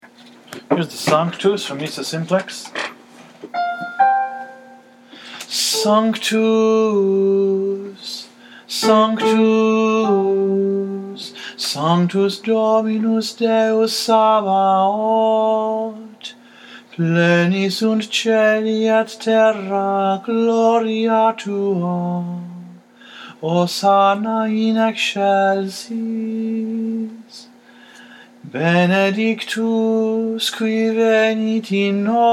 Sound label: satb unison; sanctus simplex